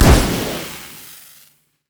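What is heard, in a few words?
fireworks phosphorus bomb; Fireworks Corki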